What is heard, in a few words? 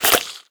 breath out, headcrab black; creatures